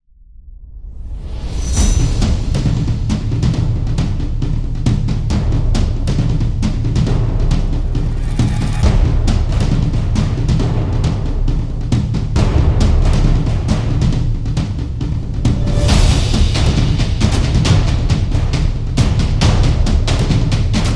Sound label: Rampage Trailer, battle drum